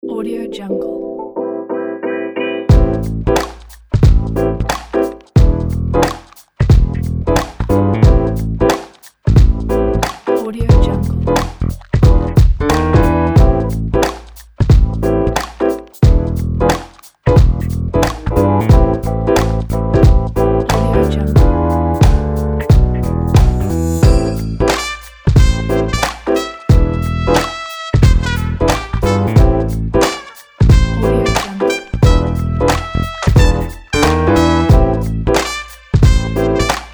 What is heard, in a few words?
lofi rb organic music